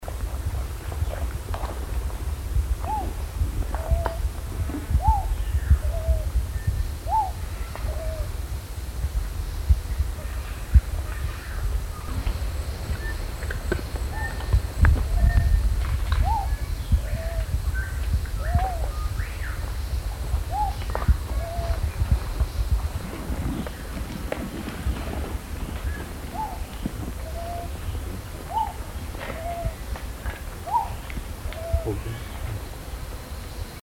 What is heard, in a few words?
mackinlays cuckoo dove